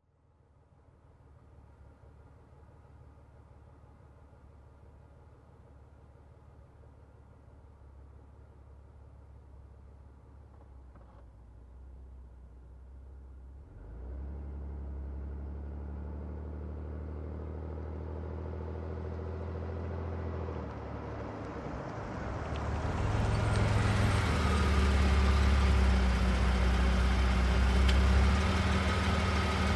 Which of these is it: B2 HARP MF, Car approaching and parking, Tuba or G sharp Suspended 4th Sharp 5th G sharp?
Car approaching and parking